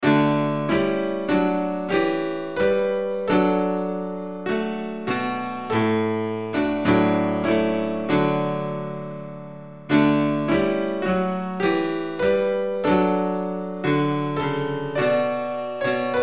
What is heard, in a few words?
instrumental hymns; o young and fearless prophet